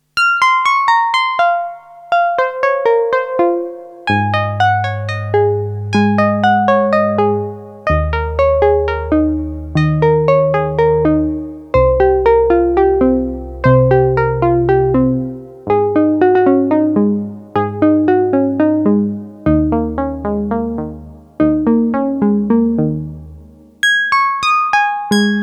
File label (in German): Prophet-5 Perkussiv; Vermona VSR 3.2